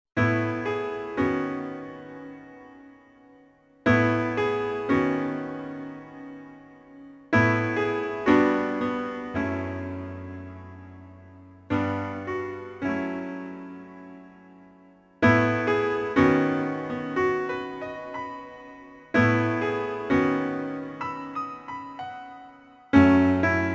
sad piano

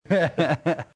laugh

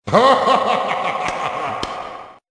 devil laugh

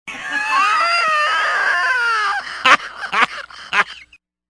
wheeze laugh

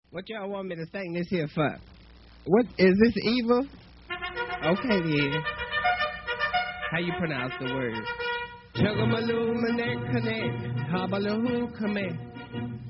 Redneck sings Aggie fight song; BaD Radio

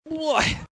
grunt

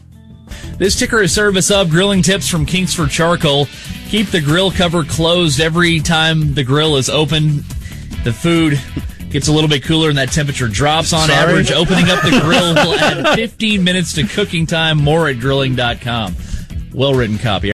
bass ticker

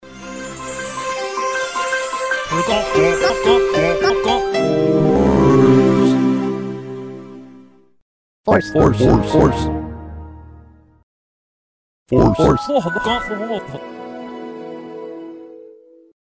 Windows start up music

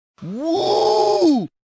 "Woo"

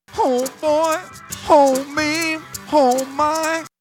sad song

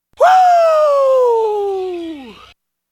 yell

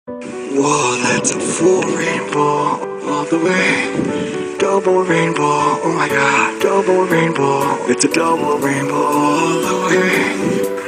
Autotune Song

